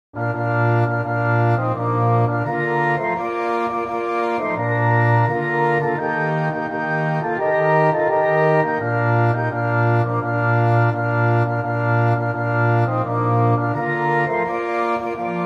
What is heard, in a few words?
Orchestra